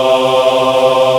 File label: Aahh Chor, VOICE; Best Service Gigapack 1